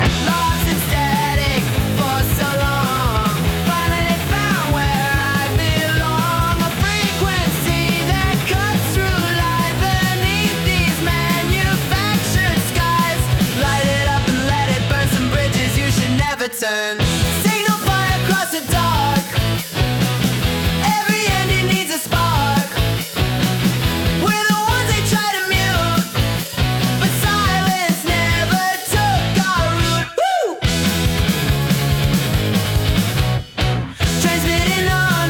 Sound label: rock